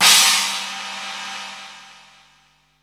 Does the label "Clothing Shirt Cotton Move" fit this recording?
No